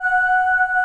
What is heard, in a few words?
BOYS, VOICE